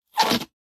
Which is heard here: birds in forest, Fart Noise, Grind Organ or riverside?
Fart Noise